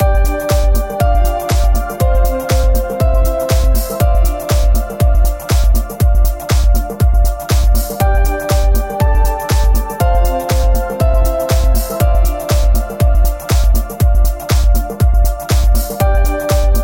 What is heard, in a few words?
tech house